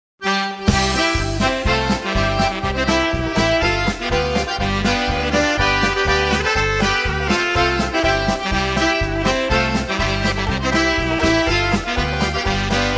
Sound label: Polka